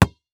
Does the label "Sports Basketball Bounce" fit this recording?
Yes